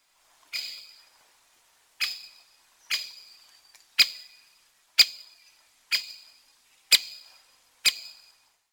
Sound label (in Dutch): Grote Bonte Specht Dendrocopos major; fluiten